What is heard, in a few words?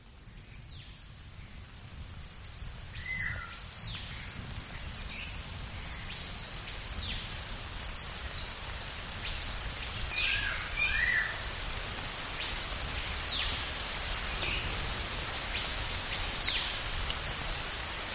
Amazonia, sounds of the tropical rainforest, nature sounds